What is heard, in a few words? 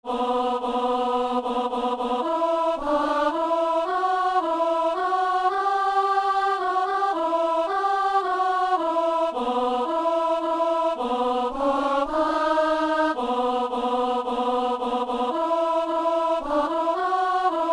alto